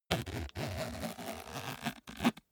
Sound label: zipper small case open slow